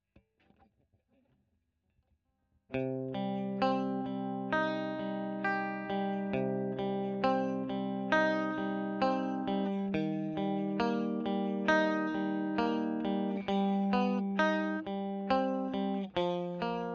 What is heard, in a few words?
E.GTR Royer